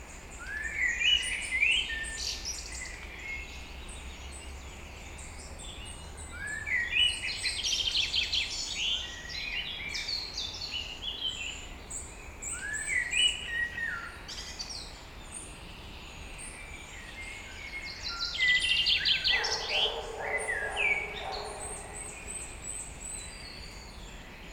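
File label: Woods, Netherlands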